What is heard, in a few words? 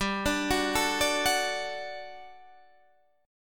G Major 7th Suspended 2nd G, Guitar Standard; GM7sus2 arpeggiated; 15,17,16,14,15,14